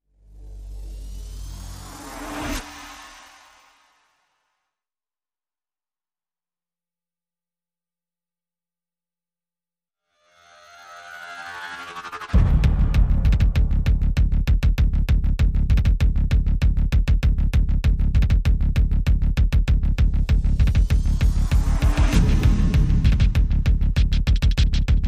Drums and SFX

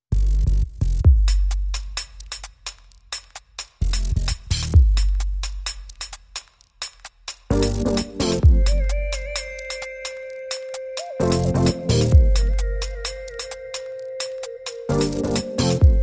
Techbeat

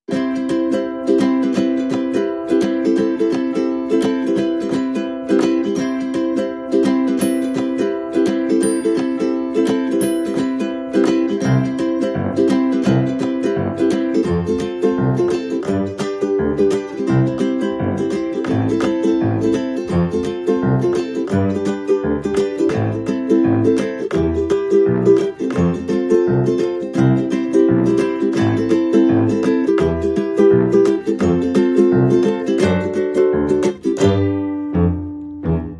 No Perc No Whistle

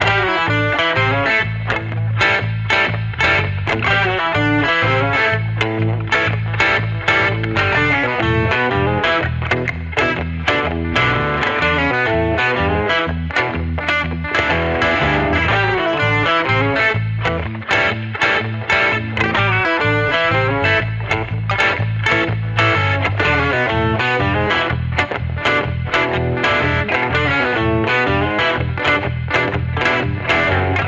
Electric Redneck, No Drums

